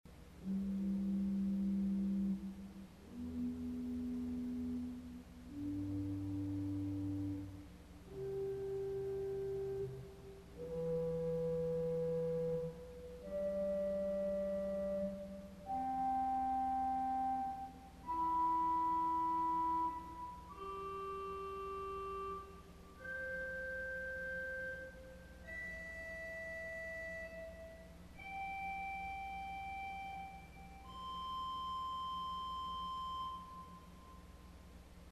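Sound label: Quintaton Arpeggio, organ stop; CulverAcademy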